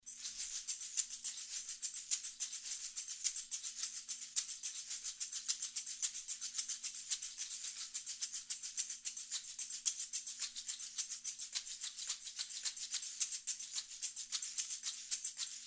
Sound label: shakerine